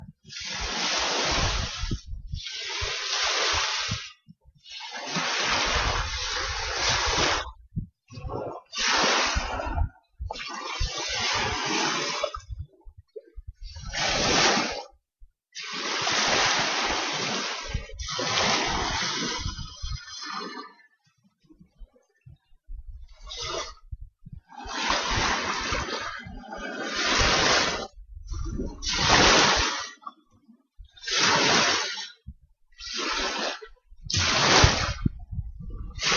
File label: lake; taupo